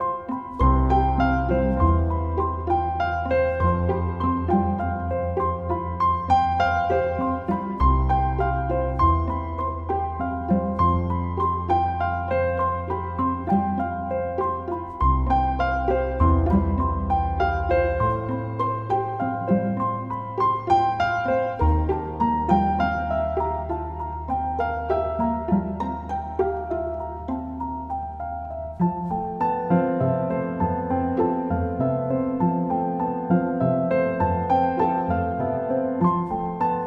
rainforest bgm regular 100bpm CMajFMaj; loop; chicory a colorful tale; ps4

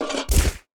Dragon Lady Victory Foley; portal quest android

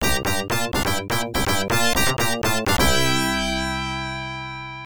Perfect Shot; American Horseshoes; Taito L System arcade

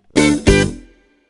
Jingle Gameover; tamagotchi connection corner shop 2 ds

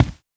run carpet

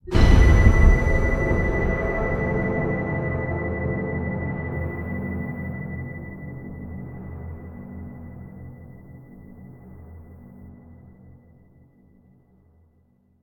sting Tense; the walking dead michonne 2 give no shelter

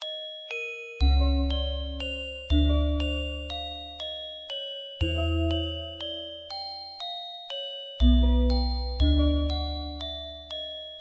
Suspense; misao definitive edition linux macos windows gamerip 2017

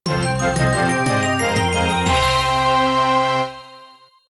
altar win; TGOP; RuneScape 3

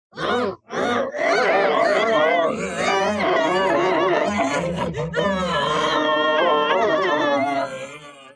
seizure

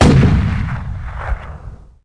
Ship Gun

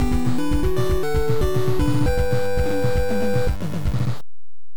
Course Clear 2 (Compliment); Heavy Shreddin; the snowboarding video game; nes

